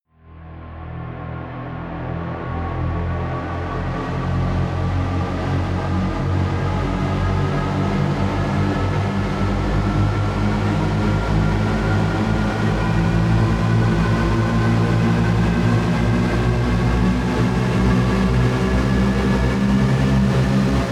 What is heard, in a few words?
synth long, drones